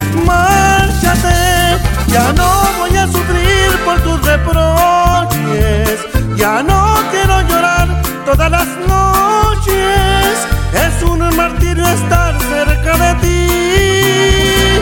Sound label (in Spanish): norteno banda; Latin